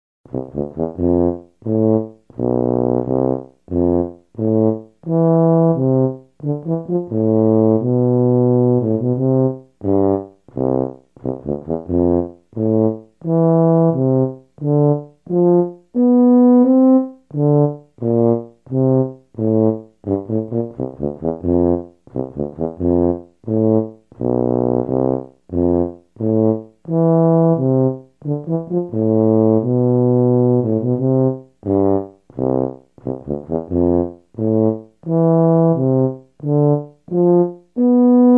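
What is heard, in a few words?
tuba sounds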